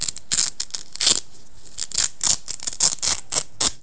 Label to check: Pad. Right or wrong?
Wrong